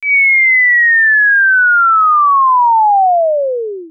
fall; electronic; move

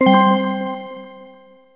decide, electronic

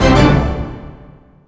shock, electronic

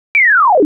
down; electronic

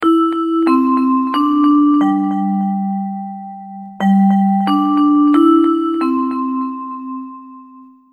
chime, electronic, school

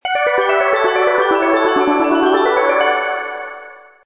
jingle

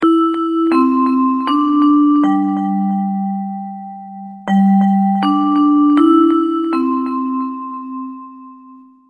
chime, electronic, school

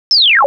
down; electronic

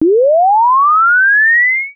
fly; electronic; move

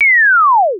fall; electronic; move

